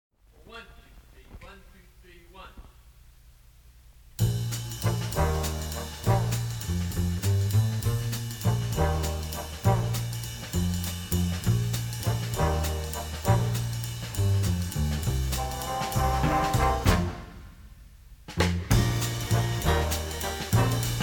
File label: Big base pad; Big Lil base pad; Offshore Pirates